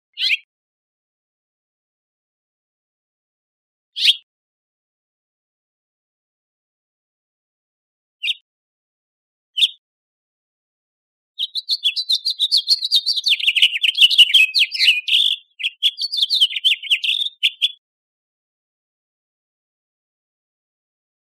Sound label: Finches; AZFO; deviche